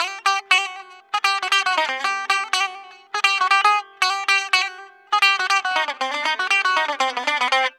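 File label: electric bouzouki; EL.BOU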